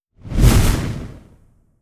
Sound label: Attack Arrow Flame; Legend of Keepers (gamerip) (2019)